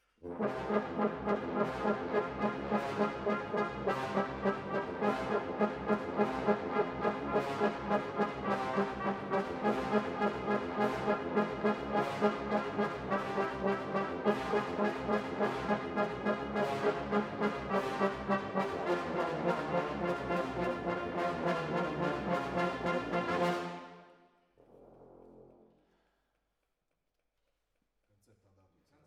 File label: Horns Over